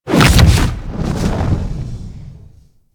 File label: Infested Jump Launch, Enemies; Warframe; Femur